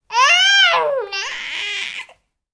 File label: baby cooing; human